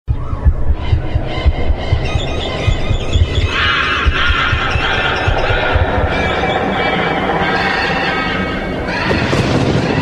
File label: haunted ringtone